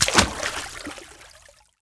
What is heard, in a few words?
player slosh